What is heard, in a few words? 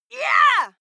female shout yeah; menschen